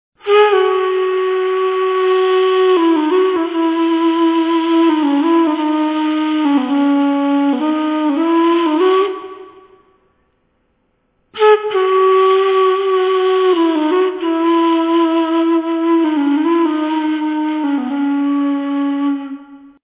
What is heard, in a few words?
ney